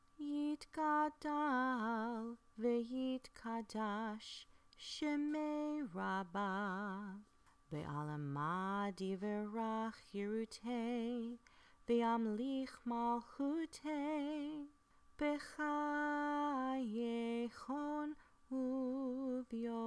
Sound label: Chatzi Kaddish